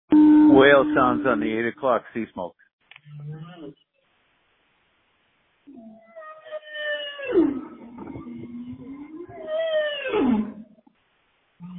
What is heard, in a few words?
whale sounds